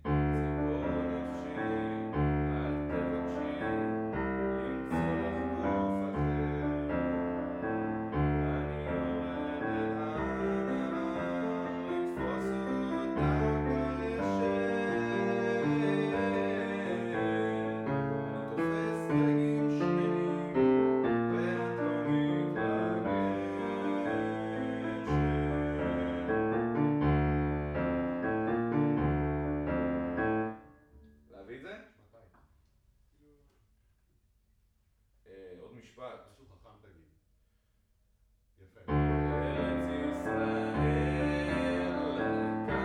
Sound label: Room L